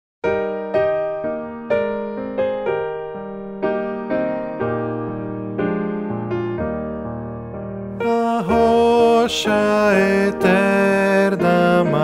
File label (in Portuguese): voz piano